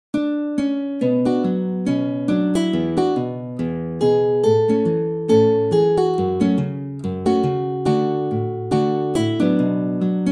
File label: synth